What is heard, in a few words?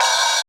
cymbal, Roland MC-909